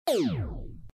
stop zatuhanie

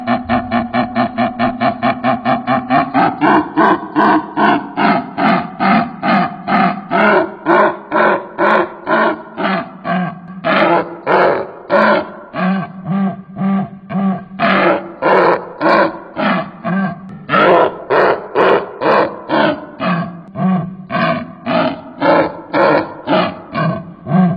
kukabara

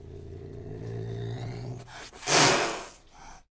karakal

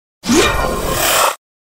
perehod kiberpank

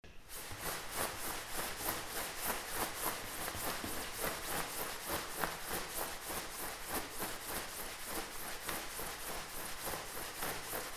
beg v kurtke

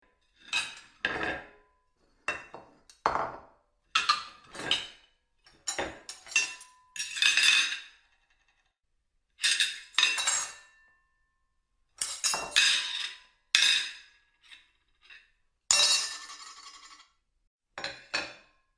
kuhnia

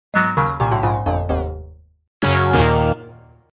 melodiya provala

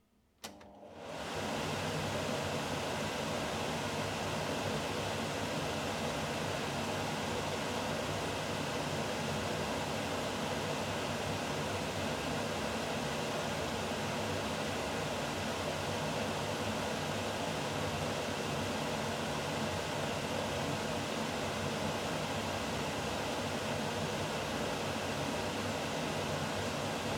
vyt; neff

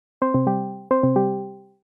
ishodiashiy zvonok; discord

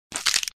neskoliko vetok slomali

